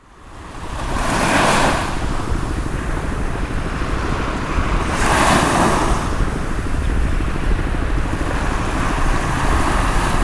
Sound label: Sea Sihanouk ville Cambodia